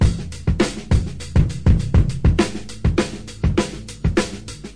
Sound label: 100 bpm, drum loops and breaks